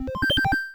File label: MOVE; C64 Dreams; Multi-Sound Default